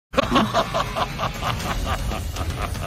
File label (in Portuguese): risada; rei das feras; one punch man; pt br